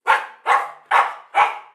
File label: pup barks; pbj pup